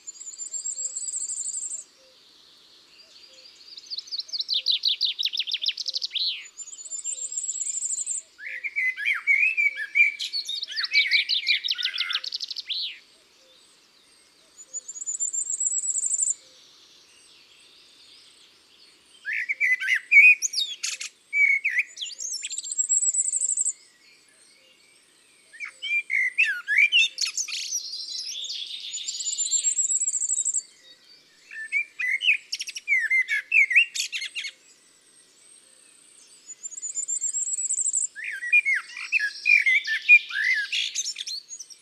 Roitelet a triple bandeau, Chants d oiseaux des bois et des forets

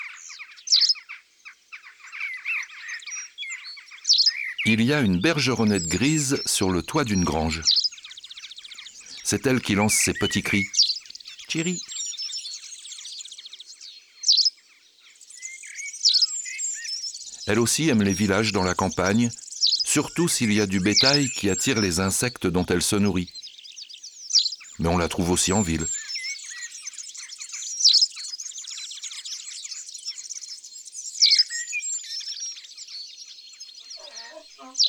Bergeronnette grise; ABC de la nature

